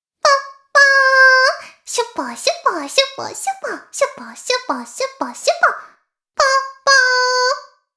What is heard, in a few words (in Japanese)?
しこしこ音声; おにいちゃんアイスぅー！; 妹